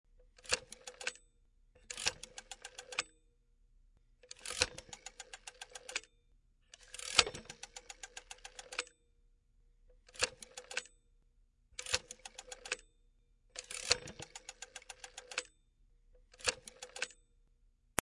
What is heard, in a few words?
Tribal